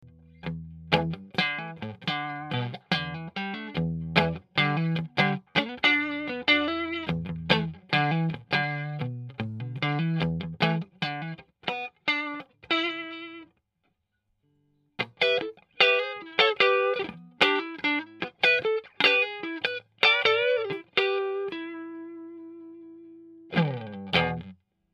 strato clean

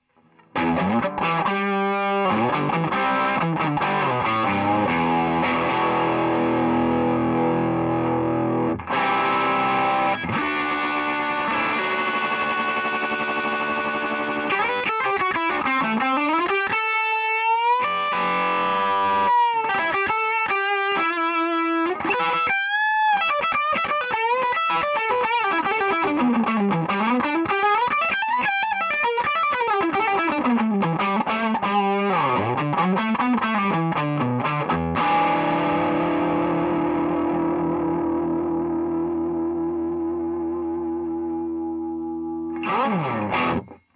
BST, preamp

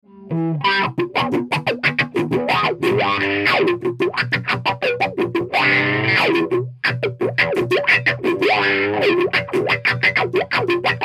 wah

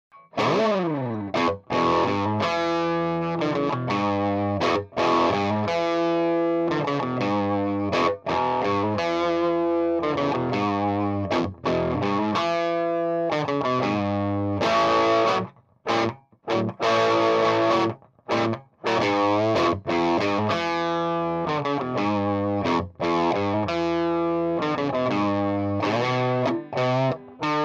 335 OD; DIY; 60s ods

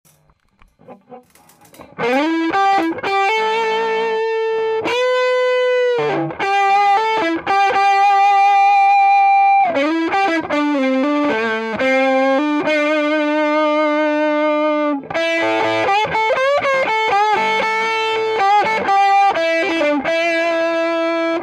es335 midup hlf

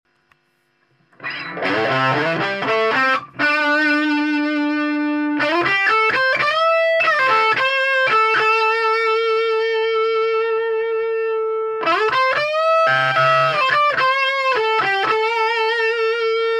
335 dst

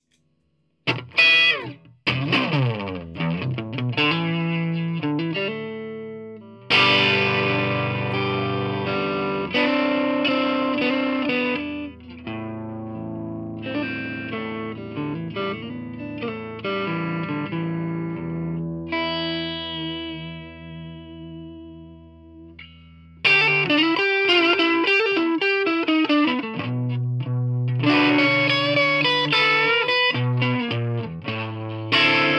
strat od

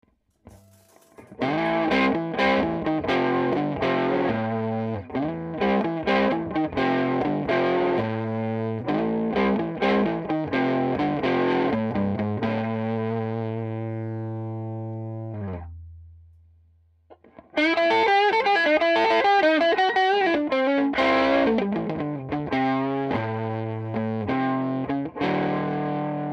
es335; DIY; 60s ods

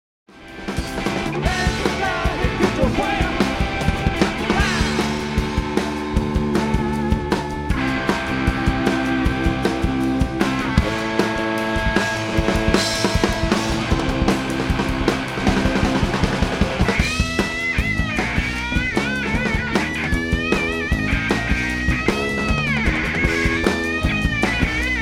el34, amp head; DIY

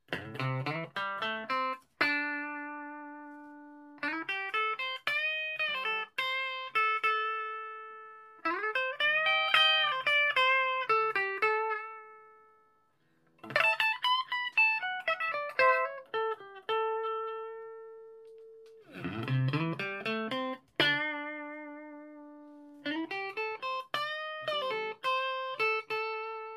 st cln

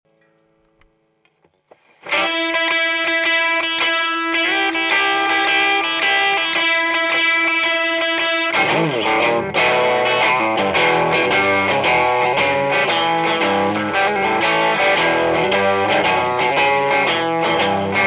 strato; DIY; 60s ods

